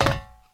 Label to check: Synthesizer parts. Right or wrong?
Wrong